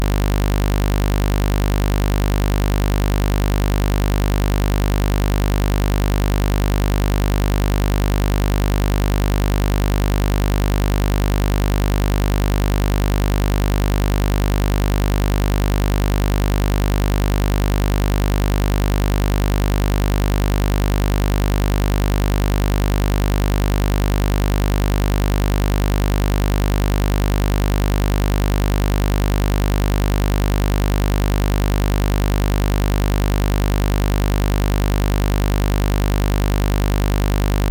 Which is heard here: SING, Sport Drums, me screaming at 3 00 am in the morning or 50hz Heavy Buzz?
50hz Heavy Buzz